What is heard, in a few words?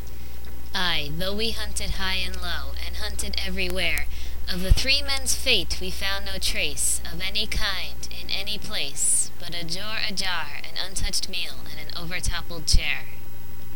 ballad, vocals